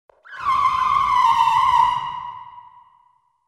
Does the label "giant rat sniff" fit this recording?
No